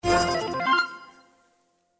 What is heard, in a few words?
multiplier added; Fluffy Favourites Megaways